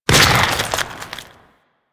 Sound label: Inf Throwing Star Explosion, Infested; Warframe; Weapons